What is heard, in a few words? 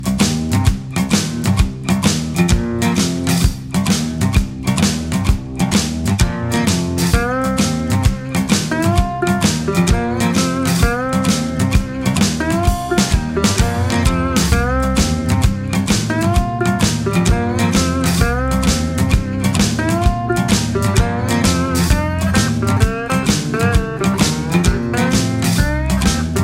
boogie woogie ringtone